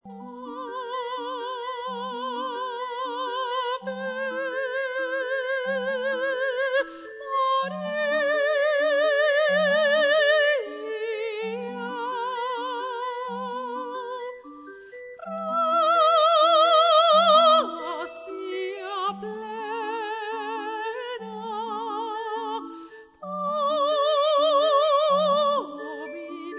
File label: Ave María; Gounod; repertorio para bodas